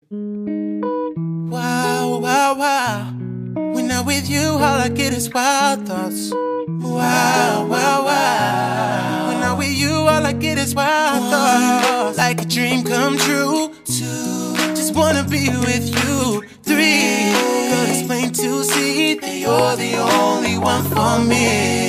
Acapella Mashup

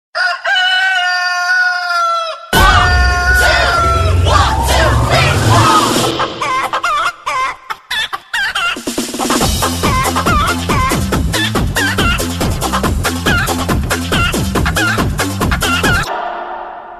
Chicken music

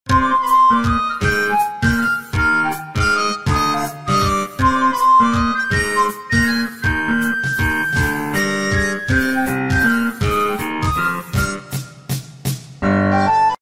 Organic Motorola

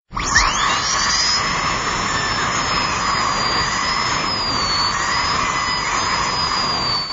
Dentist Drill

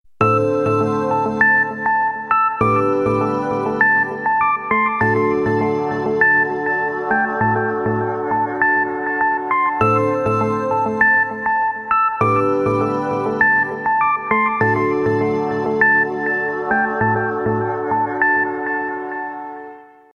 Electro Sound

